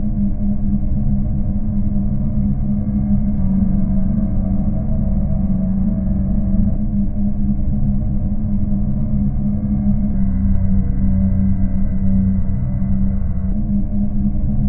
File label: ghoulish ambient pads; LOOPING AMBIENCE